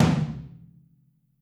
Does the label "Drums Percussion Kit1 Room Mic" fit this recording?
Yes